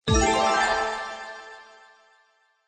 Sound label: open